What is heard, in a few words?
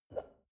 shell upside down grab from table soft; berufe; gastronomie; soundpool